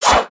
Swing; Little Friend

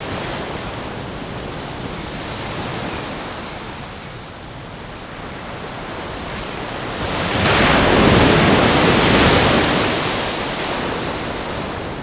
Waves; gnarled forest

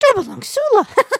excited, emotes

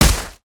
Impact; Valiant

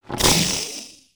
Exotic Lizard Speak; creatures pets

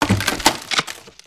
Pet Rock Dog House Smash; destructibles

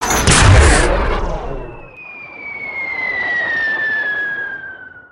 pirate cannon fire

